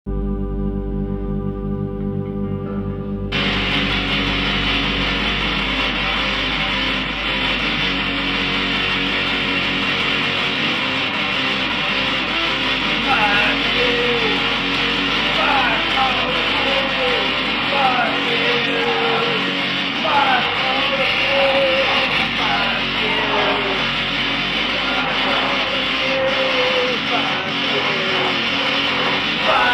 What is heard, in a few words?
punk song